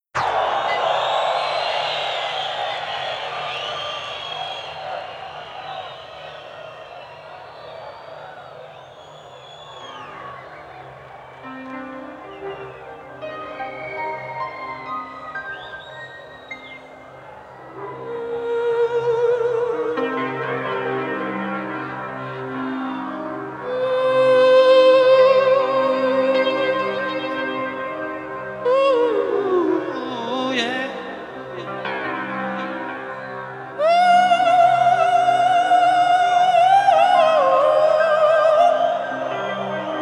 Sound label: blues live